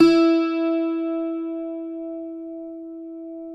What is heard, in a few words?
cuatro E; Spectrasonics; Hans Zimmer Guitars VOL-2